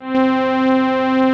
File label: bomba C4; Ableton Foundation FreePacks; Foundation Glitch Toys & LoFi